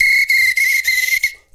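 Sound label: foudang groove; Best Service; World Colours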